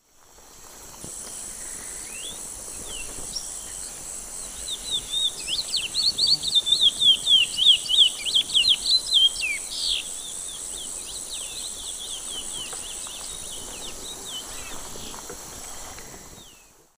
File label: Oryzoborus angolensis, songs 2 birds; S. America; Eastern Lowlands